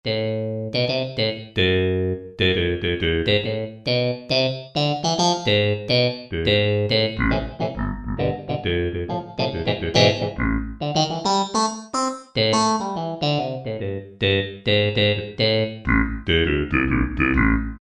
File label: Voice, Korg T3